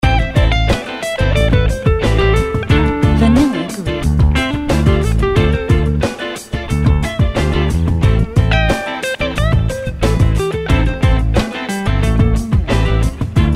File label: Crisp Clean Guitars